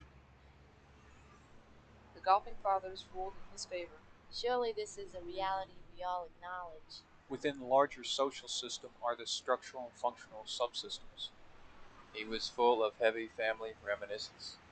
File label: output mmse bc traffic; Noise Power Spectral Density Estimation; wiener